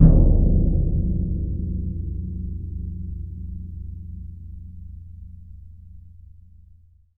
BS DRM P; ILIO; Synclavier Percussion 2; World & Orchestral

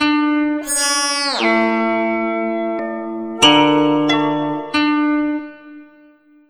KOTO LP FX, KOTO FXLP; USB Soundscan vol.60; AKAI

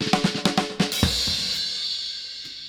Funky16 127, Fills; Zero-G; Monster Beats

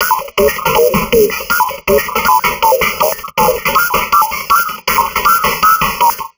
160, Drum Loops Crazy Processed

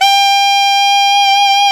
sax alto mf; Alto 414

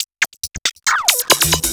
percussion loops, Techno, 138

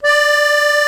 AKORDEON D 3, ACCORDION

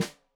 Snare Stereo, Drums; Deeply Sampled Drums

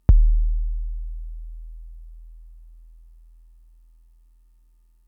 Kick, Drum Machines Fricke MFB-522

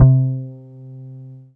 BAS FM DX-7

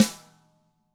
DW HIT, Drums; ILIO; Double Platinum Drums 1; DW+DW

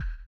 SONAR R; Spectrasonics; Metamorphosis

